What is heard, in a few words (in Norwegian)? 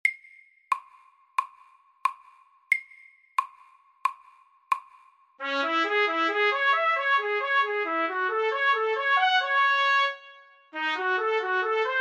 Etyde i Ess moll FORT